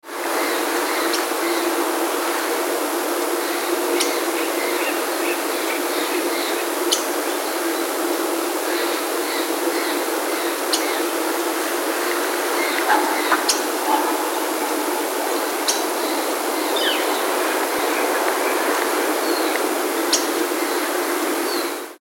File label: Black-Headed Grosbeak, pik call